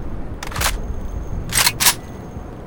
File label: stg reload; Weapons; Guns Interact; Civ